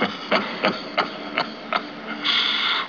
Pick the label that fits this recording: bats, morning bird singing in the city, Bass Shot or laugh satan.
laugh satan